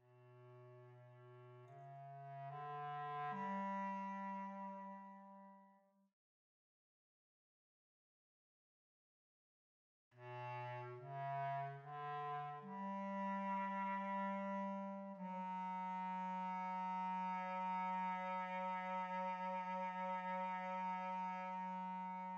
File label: Bass Clarinet